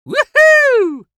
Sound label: woo; vo; Half Life 2; Episode 1; c17